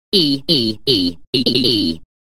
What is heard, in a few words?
EEEE